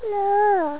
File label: miss letter; Hang2000 v1.1